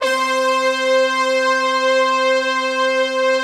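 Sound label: brass tutti, Slow Brass Tutti; Optical Media International - Sonic Images Library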